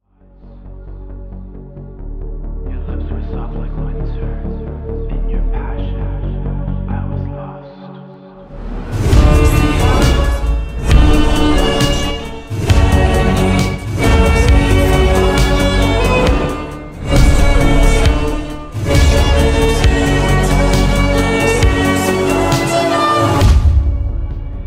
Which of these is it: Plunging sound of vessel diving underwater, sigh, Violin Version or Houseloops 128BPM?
Violin Version